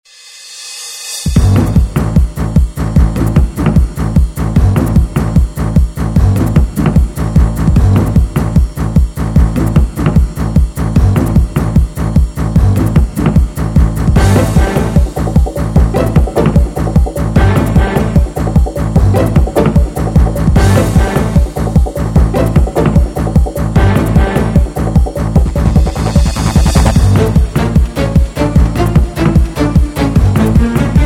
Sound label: action mus